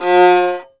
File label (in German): GEIGE AUFSTR, Alpine Volksmusik